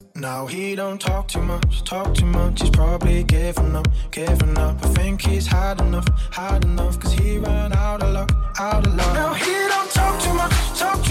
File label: sad story ringtone; merk